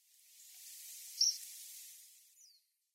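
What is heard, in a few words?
Tree Pipit; koe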